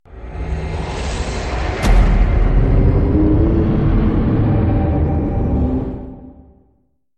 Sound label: you died full notification sound